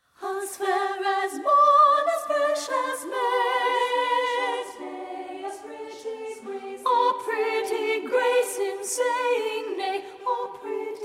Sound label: SOP1; As Fair as Morn